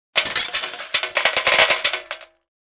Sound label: Casino game slot machine coins dropping into pay out cup